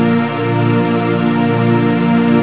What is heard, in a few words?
calmness, music